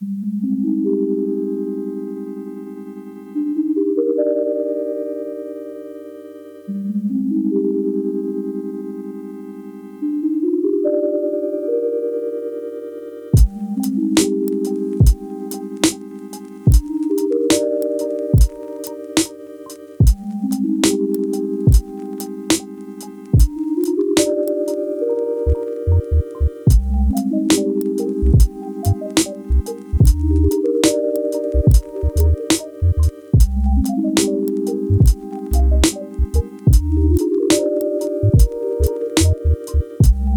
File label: lofi hip hop